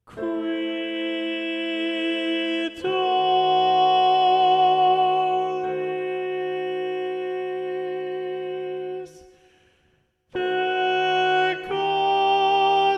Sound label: Alto Predominant; Gloria - Vivaldi; Qui tollis (Gloria)